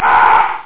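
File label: monster scream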